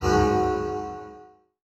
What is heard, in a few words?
Multimedia Internet CD-Rom Flash Beep Low Pitch